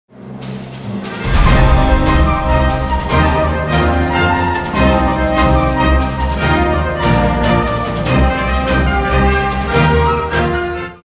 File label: Loud but not clipping anymore, With Limiter; Mixing Mistake 3